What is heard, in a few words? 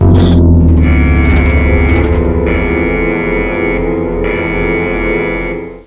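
nuclear bell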